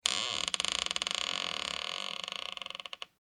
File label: Horror Door Squeak Creak Slow; Creepy Doors (Sound Effects:Horror)